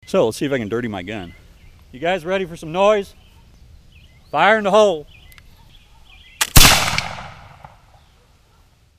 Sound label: rifle